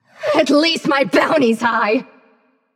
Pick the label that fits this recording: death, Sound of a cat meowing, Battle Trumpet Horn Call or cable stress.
death